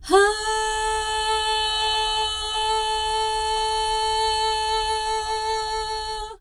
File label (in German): frauenstimme ha a2; instrumente